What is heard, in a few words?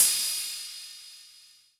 Kawai K11 Ride Cymbal